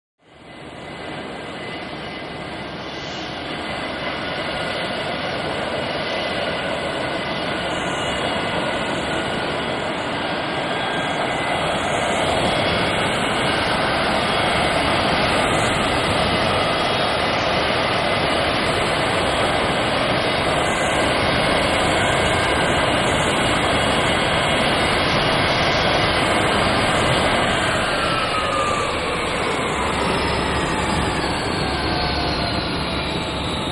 Jet, Large, Exterior Perspective; Taxi And Stop Close, Bell Rings, Wind Down To Idle.